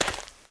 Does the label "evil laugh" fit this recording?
No